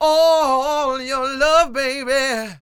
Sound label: SING W, Vocal Planet Blues & Soul, BLUESY; ILIO